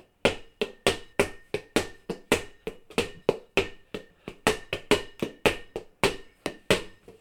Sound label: plastic ball bounce